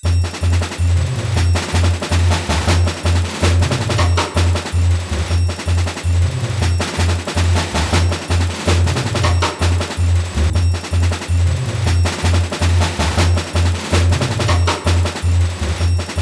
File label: Drum Loops